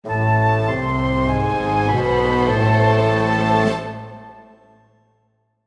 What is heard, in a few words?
lose theme; mirror mirror the untold adventures ios gamerip 2012